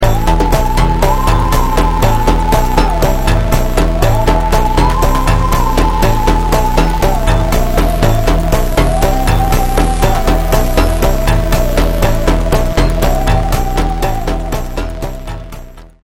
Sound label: TESTSOUND